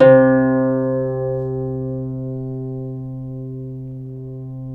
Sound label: CEL HARP C2; USB Soundscan vol.23; Historical Instruments; AKAI; CEL.HARP1